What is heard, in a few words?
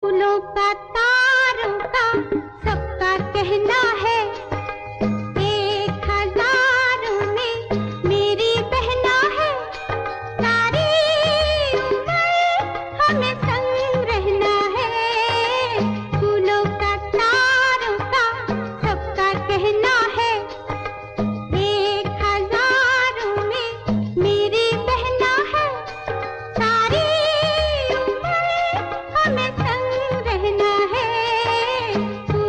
hindi; hare rama hare krishna